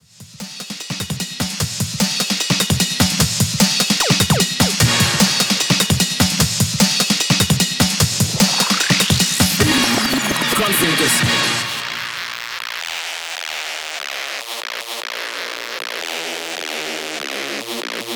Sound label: Gun Fingers